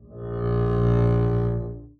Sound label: arco bb1 ff up, double bass